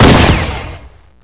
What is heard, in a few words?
boss gun